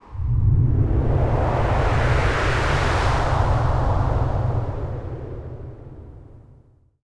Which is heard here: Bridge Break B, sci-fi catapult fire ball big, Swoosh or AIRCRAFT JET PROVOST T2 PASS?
Swoosh